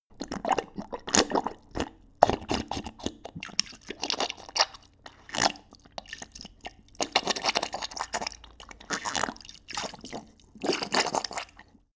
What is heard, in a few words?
dog drink bubbling; natur-tiere; hunde